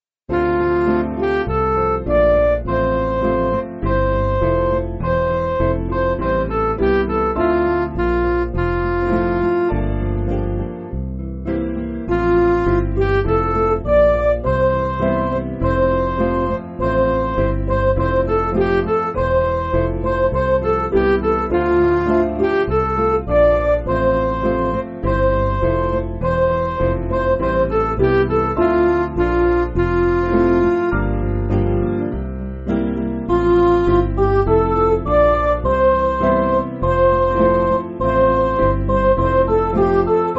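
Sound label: Midi, Music Backing Files for Churches; Love Came Down; Gartan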